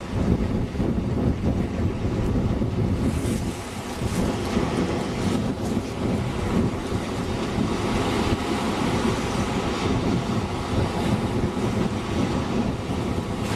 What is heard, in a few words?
Debris